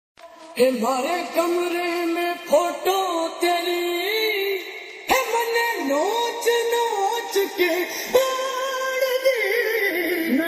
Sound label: Hindi song ringtone; new hindi song ringtone